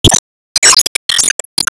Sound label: Ball Build; Se Sp Ball Build